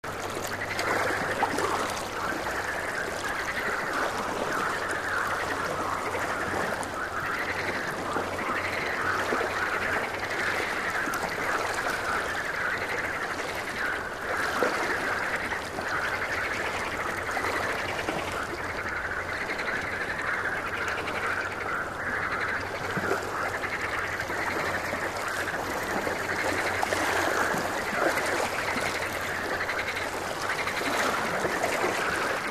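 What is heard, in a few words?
boat ride, ambience stereo; mohaa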